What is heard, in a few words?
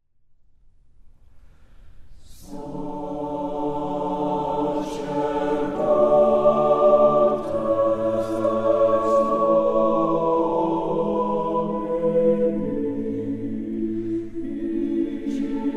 Chorale